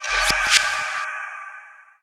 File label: p atk darkflute cast